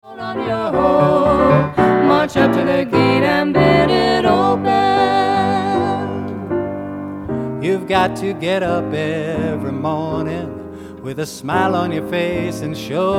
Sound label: optimistic voices beautiful